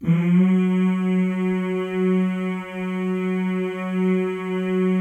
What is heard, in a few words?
male; Spectrasonics; Symphony of Voices